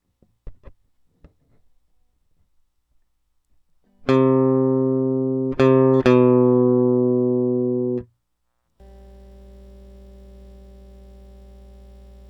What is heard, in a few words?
Elect Guitar